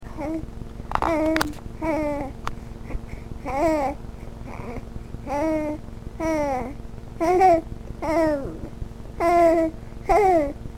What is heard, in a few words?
laugh